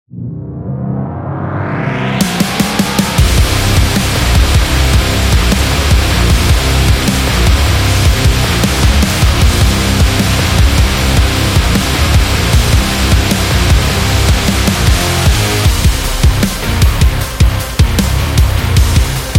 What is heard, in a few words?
Metal Extreme